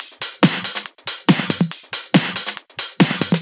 Loop 140 BPM; vengeance essential club sounds-3

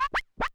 Cuts, Vinyl Record Samples

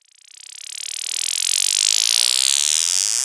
Sweeps Medium

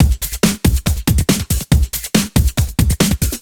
Breakbeat 140 BPM

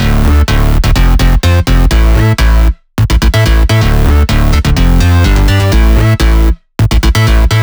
126BPM Melody; Vengeance Samples; Secrets Kit

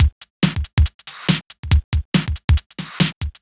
Breakbeat 140 BPM

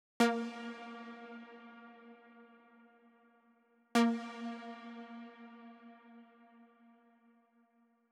128BPM Hit Root A#, TechHouse; Titano; Vengeance Samples; Bonus TechHouse Kits

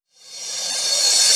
Cymbals Reverse; Vengeance Samples

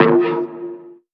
Synth Sound; Vengeance Samples; VEE Synth Shots

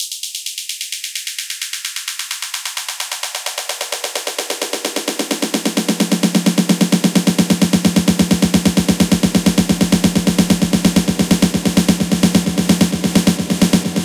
128BPM Love Nation, House Electro Fills; 8Bars; Vengeance Samples

